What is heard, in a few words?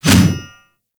Mace Whoosh; Warframe